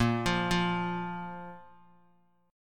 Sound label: Bb 5th, Guitar; 4F,4A,5C,5G,5C,6E; Bb5 arpeggiated; 5,8,5,x,x,x; JGuitar